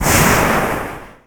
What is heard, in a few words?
Steam Burst